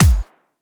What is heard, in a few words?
Kick I use